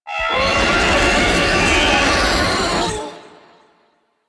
Vocal Attack, Enemies; Warframe; Teralyst; Ropalolyst

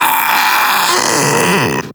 Infested Splitter Split Top Vocal Alerted; Warframe; Grey Strain

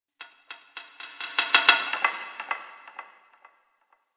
Wood Stick; Warframe; Ambience; Clan Dojo; Dojo Randoms

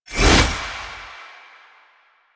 Gunbrella Aim Transition In, Weapons; Warframe; Tenno; Koumei

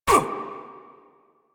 Voice Enemy Hit Standard Noise; Warframe; Caliber Chicks minigame

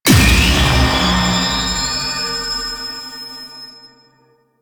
Enemy Spawn

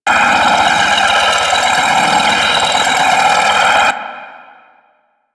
Sector Wars Hologram Loop, Ambience; Warframe; Tenno

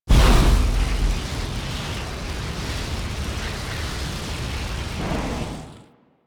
Flame Vortex B; Ambience; Props; Loops; Warframe; Eidolon Plains

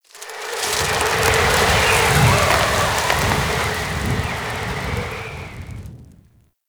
Settlement Wind Debris One Off; Ambience; Warframe; Grineer Settlement

